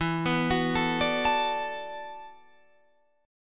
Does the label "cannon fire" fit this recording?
No